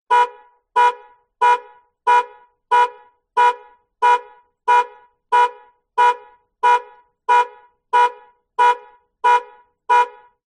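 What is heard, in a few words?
Car Alarm Medium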